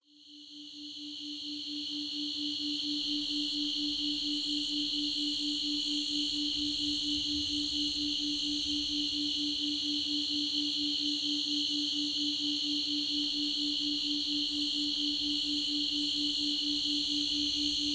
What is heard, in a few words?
Quiet night Binaural Beat Sleep Induction